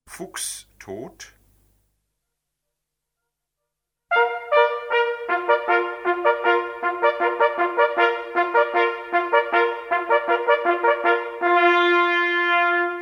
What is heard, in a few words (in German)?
Fuchs tot, Jagdliche Praxis